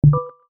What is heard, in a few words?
notification